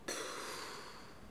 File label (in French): pfff soulagement; khanat